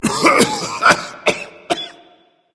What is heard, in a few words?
clear throat berserker; cuchulainn